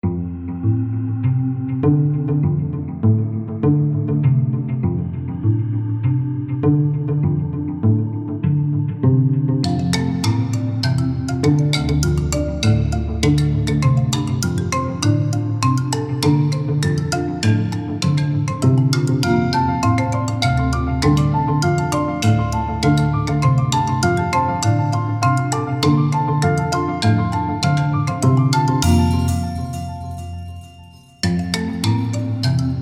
Dribbler; Noisebiscuits

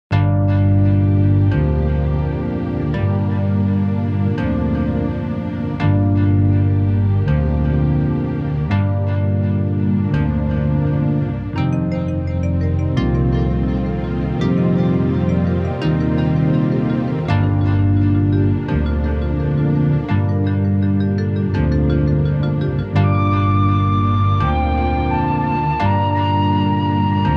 Nightjar; Noisebiscuits

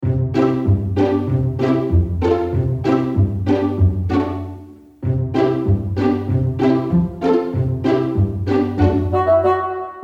Cavorting Cubs; Noisebiscuits

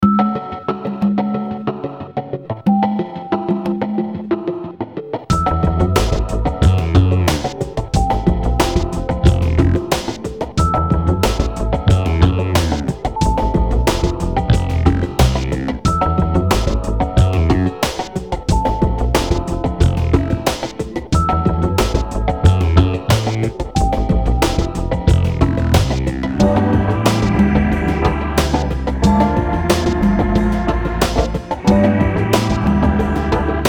Space Lounge; Noisebiscuits

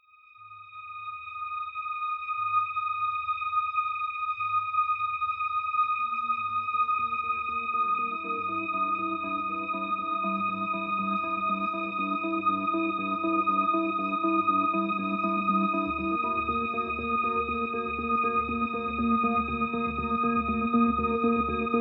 High Alert; Noisebiscuits

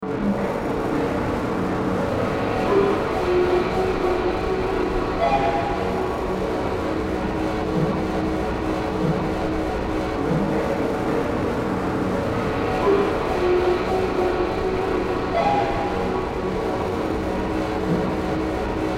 Slow Day; Noisebiscuits

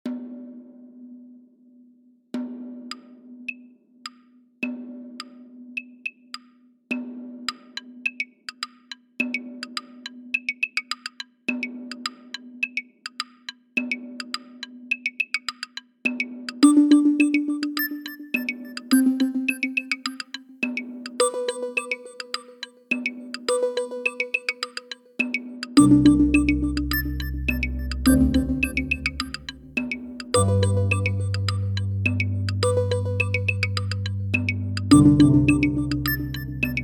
Square Day Countdown; Noisebiscuits

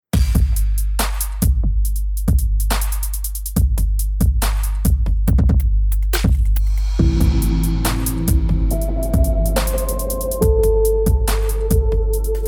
Straps; Noisebiscuits

